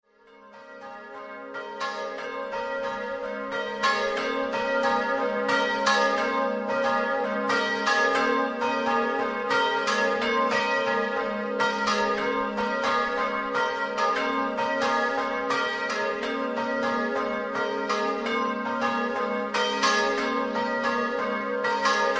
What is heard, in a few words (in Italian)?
BONZICCO, campane